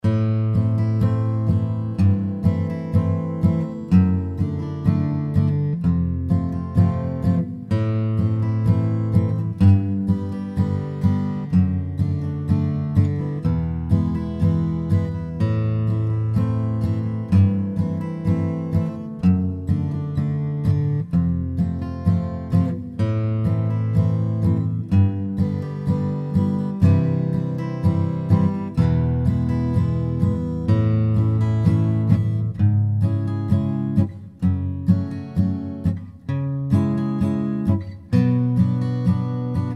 strum style tunes

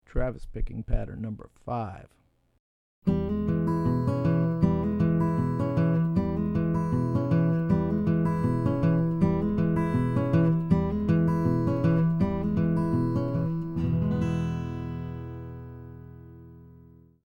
Travis Patterns; travis style lesson materials

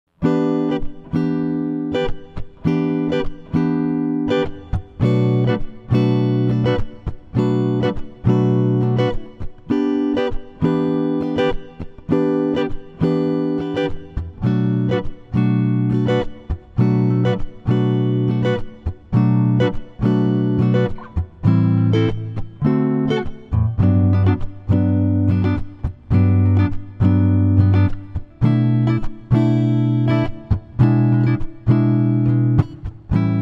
Barre Chord; jazz tunes

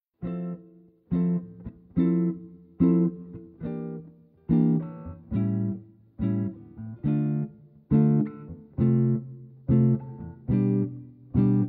comp, jazz tunes

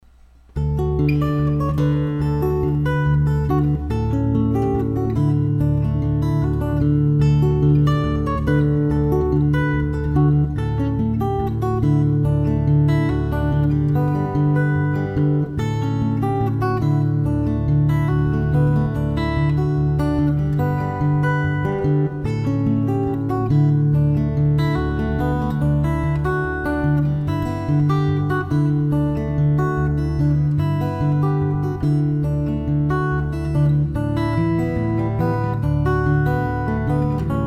Basic, bluesroots fingerstyle tunes

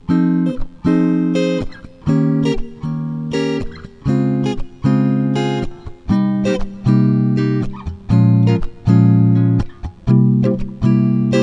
Bar Chord Comp, jazz tunes

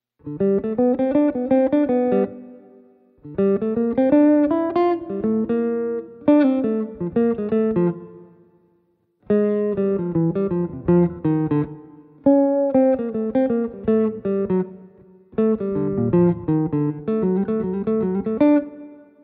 melody, jazz tunes

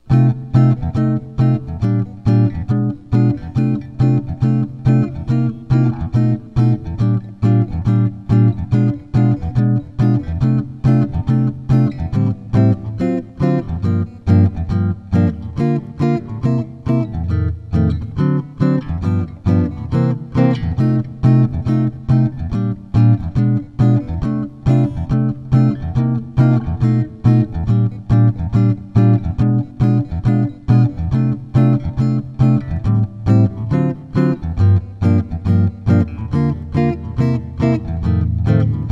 Chorus, jazz tunes

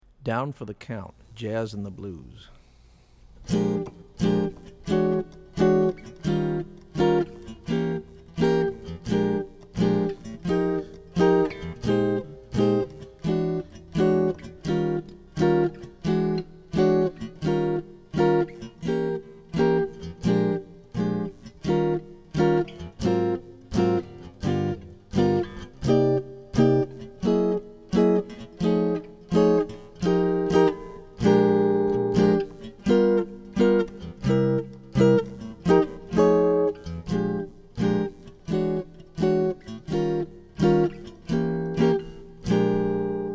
Jazz Blues

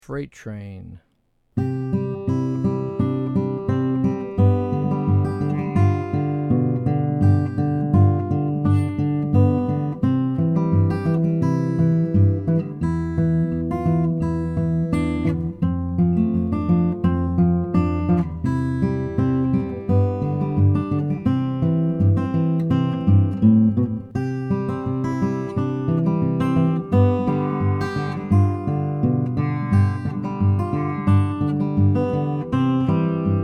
bluesroots fingerstyle tunes

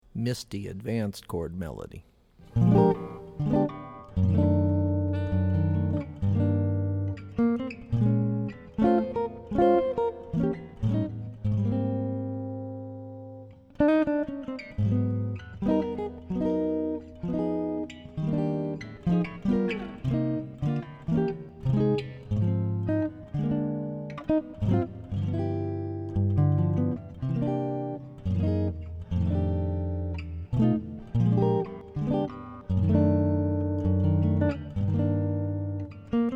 Advanced Chord Melody; jazz tunes

